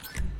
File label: buy